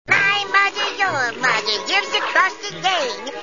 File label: sing